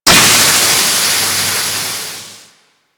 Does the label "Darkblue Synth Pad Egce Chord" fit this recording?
No